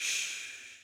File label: Beatbox Shhh